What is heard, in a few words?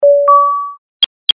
sound check; nest protect